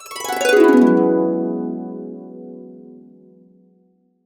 E, Harps; USB Soundscan vol.62 - Complete Harps; AKAI

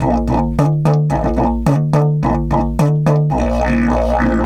108, Didgeridoo

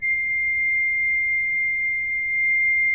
C6, Cathedral Organ; ILIO; Propeller Islands

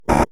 Stabs, Elektron MachineDrum; SPS1 MKII

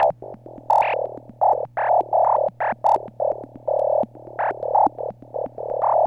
TEK DRONE 126, SYNTH SEQ; AMG; Global Trance Mission VOL-2

